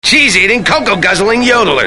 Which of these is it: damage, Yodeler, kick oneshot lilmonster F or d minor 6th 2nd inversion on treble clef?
Yodeler